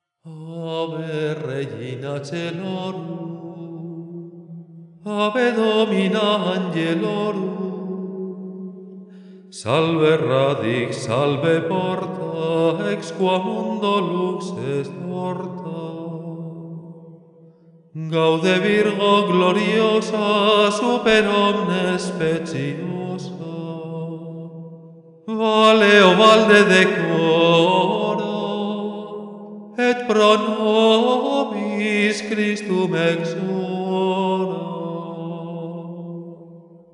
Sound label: Gregorian Ave Regina Caelorum (Guide Solo)